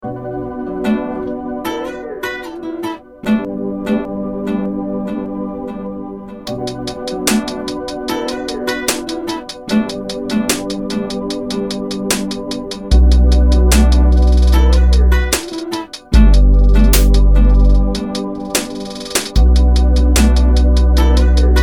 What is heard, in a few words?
BEAT